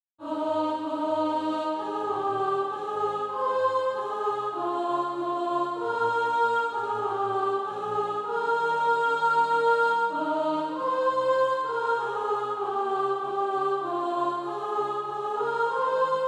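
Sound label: soprano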